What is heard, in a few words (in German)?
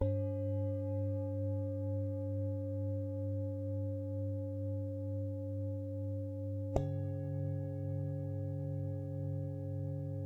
nur klangschalen mit rauschverminderung rode nt usb